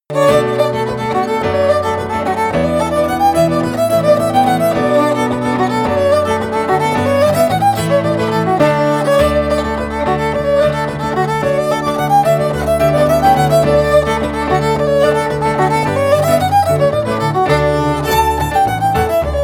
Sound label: fiddle; merry blacksmith; SeamusGrantFestival